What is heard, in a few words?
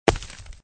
stone break; Tempala